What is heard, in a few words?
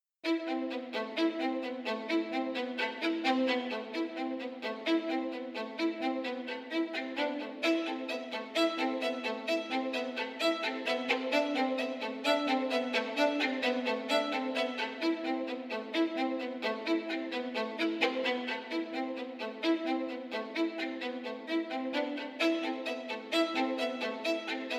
butterfly violin